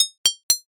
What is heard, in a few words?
dishes